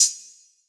Open Hat Verby, 808; 808 Open Hats; Slate; Vintage Drum Machines Volume 2 808 Edition

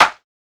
snare push, Hip-Hop Sample Pack, Drum One Shots; Drums; Slate; CAICOS Hip-Hop Sample Pack

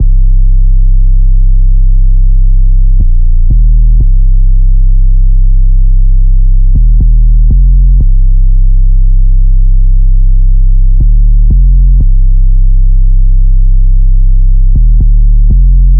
808 loop 120 Cm; Slate Digital BASSHOLE Sample Pack

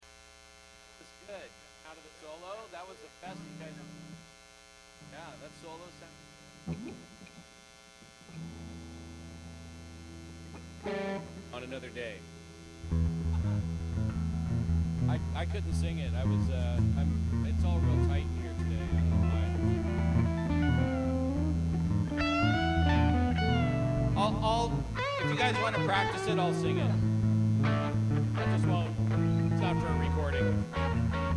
Buzz and Feedback Mix

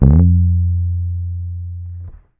Bass one shot Slide F, Electric Bass; Slate Digital BASSHOLE Sample Pack

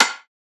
perc rim dry, Drum One Shots; SD MIDNIGHT Sample Pack; Slate

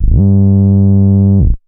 Synth one shot Glider G, Bass; Synth Bass One Shots; Slate Digital BASSHOLE Sample Pack

